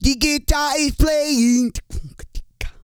REGGAE, Vocal Planet World Voices

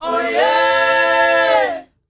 O YEAH, S. AFRICAN, World Voices; ILIO; Vocal Planet

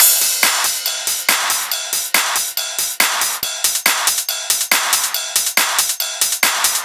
drum Loop 140BPM Full; Multi Loops

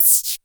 NOIZ, Dual Percussion Synthesiser; Klone

